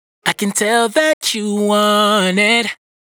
Dry Vocals hook Want It 86 D#m; Raw Vocals; Slate Digital Omnivox Vocal Sample Pack

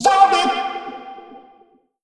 Omnivox oneshot F, Vocal; One Shots; Slate Digital Omnivox Vocal Sample Pack

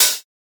hat; Hats

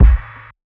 kick shh; Drum Sample Pack; One Shots; Slate; SEISMIC

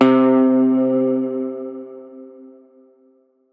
tonal oneshot C; Pop; Slate; PASADENA Pop Sample Pack; Tonal One Shots

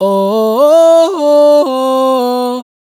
riff oh 108 Em, Dry Vocals; Raw Vocals; Slate Digital Omnivox Vocal Sample Pack

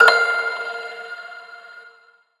tonal oneshot F; Pop; Slate; PASADENA Pop Sample Pack; Tonal One Shots

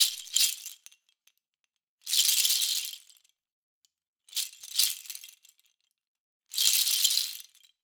rattles loop, 110; perc construction loops; SEISMIC Drum Sample Pack; Slate

